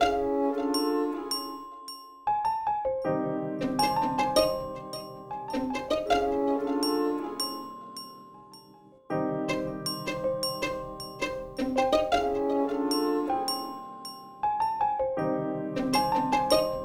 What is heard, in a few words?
Melody